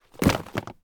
Landing On The Ground

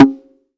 Bongo

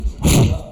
Dash noise

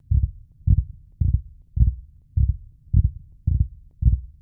Stress Heartbeat

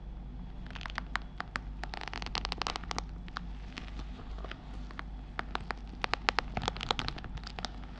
Leather Creak Glove

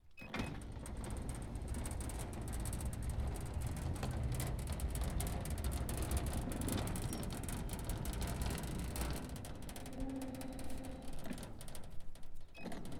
Gate

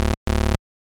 Error 8 Bit

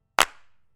Clap

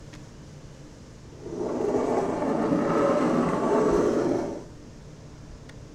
Metal On Wood

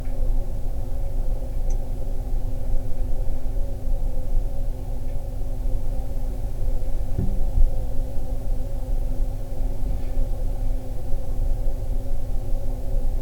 Silence

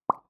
Pop Neutral

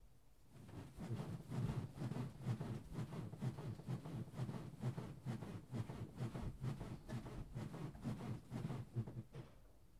Bed Jumping Ons Noisy Bed

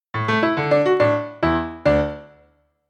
Piano Jingle

